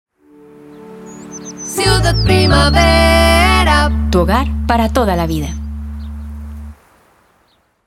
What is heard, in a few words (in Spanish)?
audiologo; ciudad